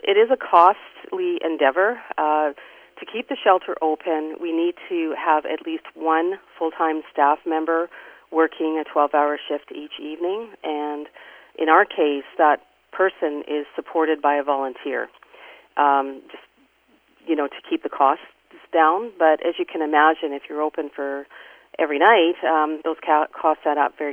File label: Foley